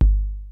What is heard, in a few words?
KICKS; Kid Nepro; Elite; KICKS SNARES